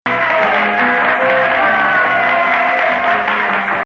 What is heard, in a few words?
Hijaz